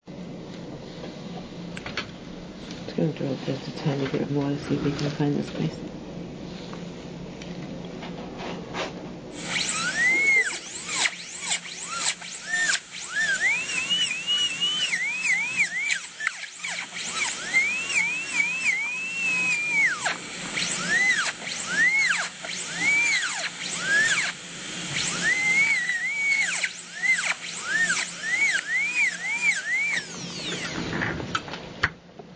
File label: Drill, Binaural Recordings